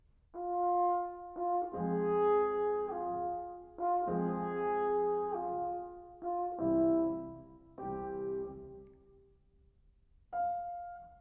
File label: Sonata For Horn & Piano in F Major - Mvt. 2. Poco Adagio, quasi Andante